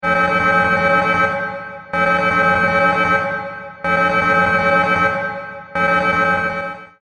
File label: All Stations Alarm